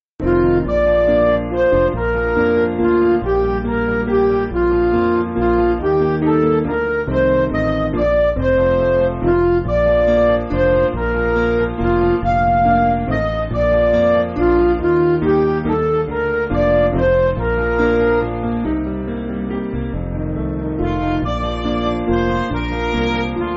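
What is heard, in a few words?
Midi, Music Backing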